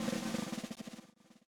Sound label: SWNG